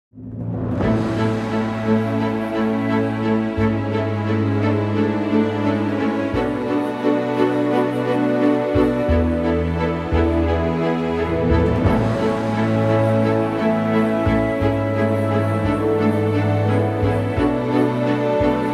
orch A

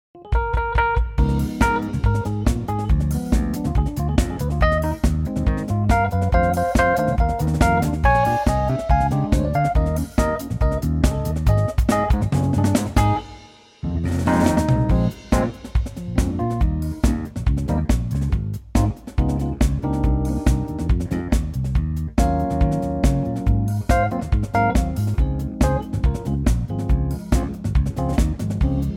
jazz smooth; key - Ab - range - Eb to F; head back to the 70's with a dirty Rhodes, a funky bass

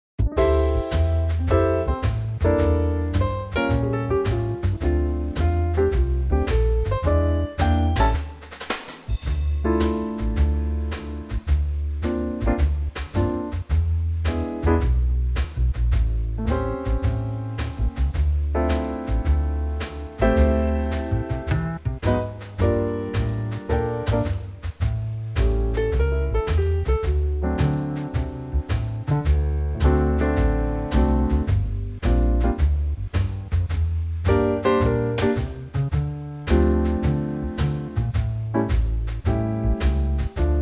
Dm Trio

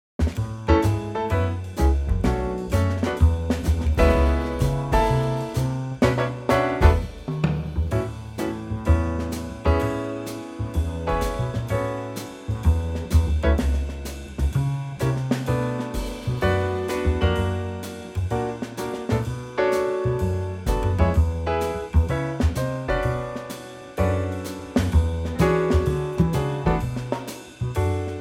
A Bb Trio

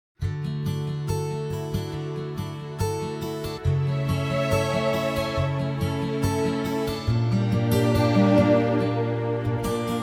D orch; key - D -vocal range - A to E

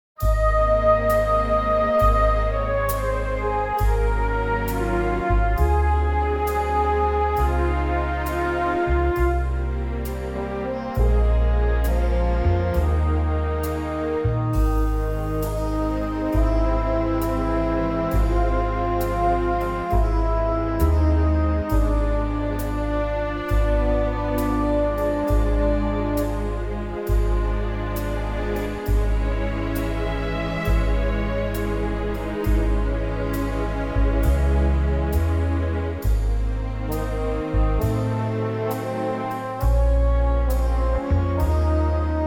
orch Ab; key - Ab - vocal range - Eb to Ab

